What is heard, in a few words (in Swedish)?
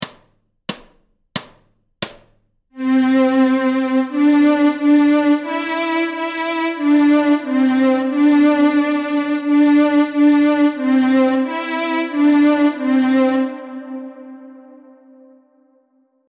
Plankan Stråk C, Fiol